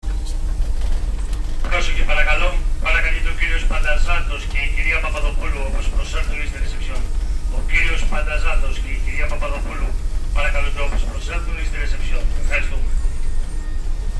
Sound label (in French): ferry, grece; Attention attention, le capitaine recherche Papadopoulos